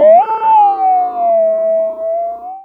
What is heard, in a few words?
Clavinet; Ambient Grooves